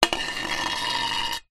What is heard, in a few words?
small metal pole scrape on smooth concrete ground; foley